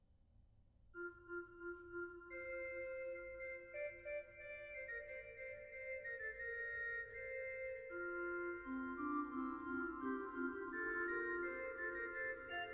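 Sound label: Fugue in F, organ; 1864; Ibach; Steinsland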